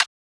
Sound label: Snare, 808; Snares